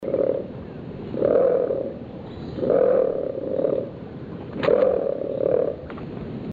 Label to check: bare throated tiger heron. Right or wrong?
Right